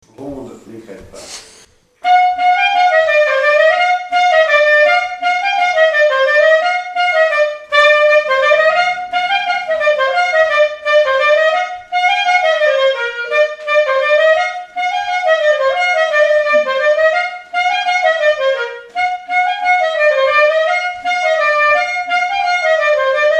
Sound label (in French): ronde les crepes, clarinette